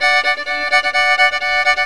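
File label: D FIDDLE GROOVE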